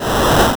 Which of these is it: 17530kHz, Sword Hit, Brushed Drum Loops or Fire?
Fire